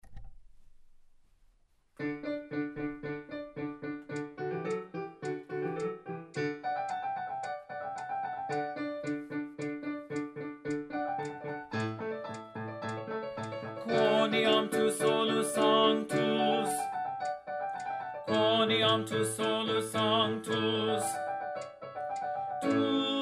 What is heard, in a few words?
Tenor; Quoniam